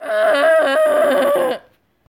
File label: Mating Call